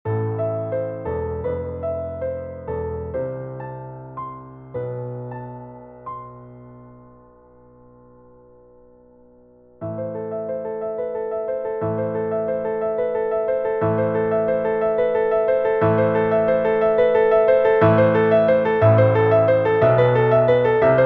pour piano; Rinfret, Alexandre; aftermath

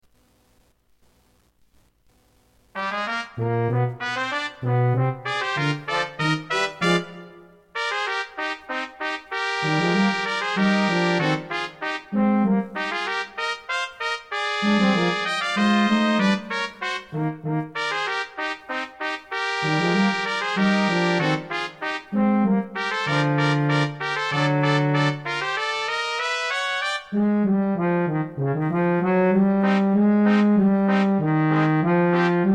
fanfare; ephemere

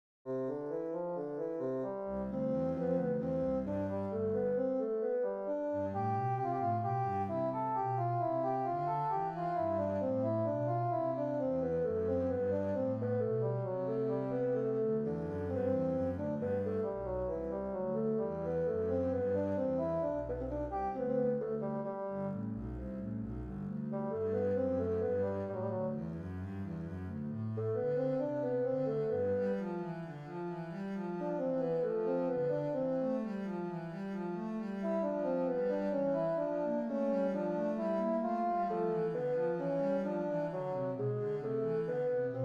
basson contrebasse contrebasson; invention deux voix; bach johann sebastian

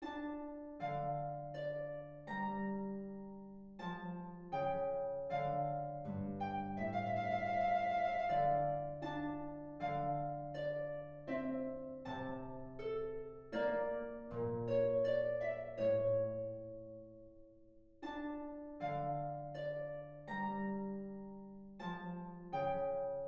sarabande mineur

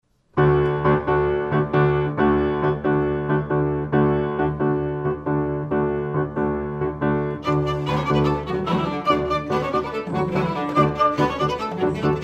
gigue irlandaise

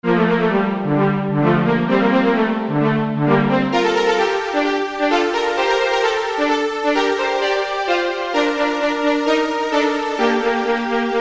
caprice pour violon alto

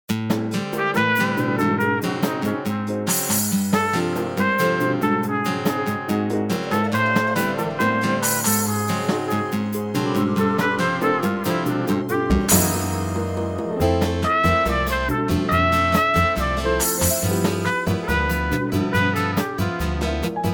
jazz fete

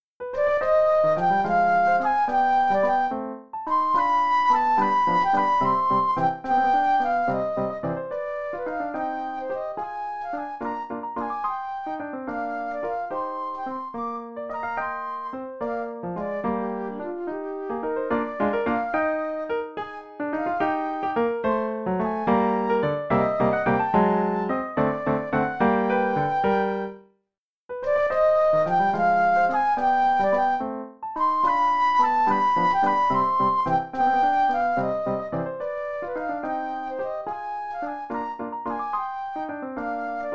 sicilienne mineur pour flute piano; rol bruno

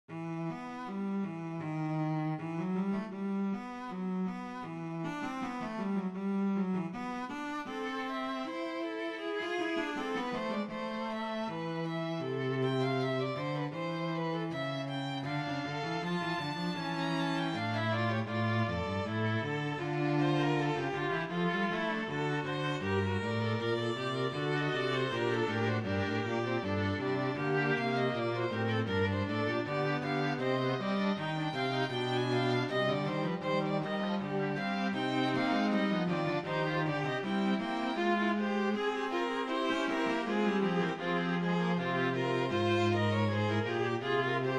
canon chromatique; bouchet eric

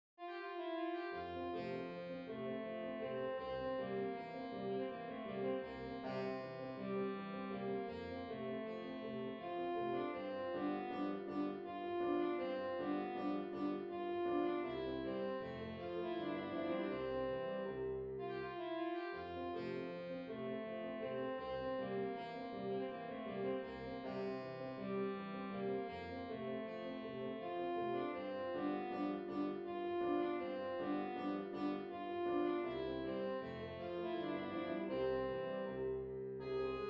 menuet quintette cordes; boccherini luigi

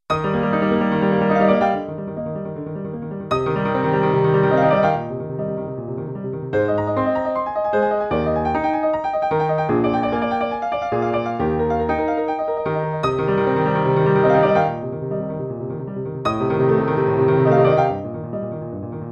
etude pour piano

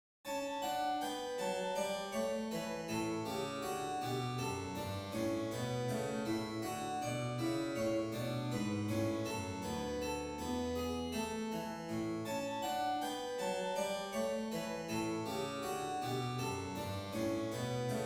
rigaudon majeur; krebs johann ludwig

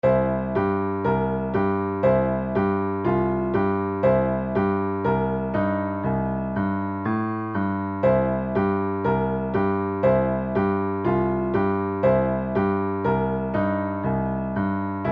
blues pianistique

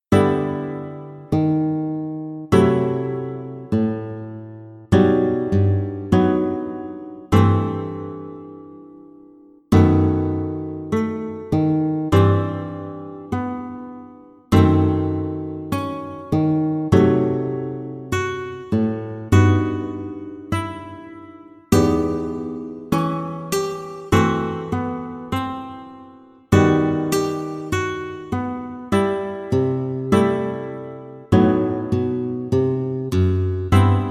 etude harmonie Em

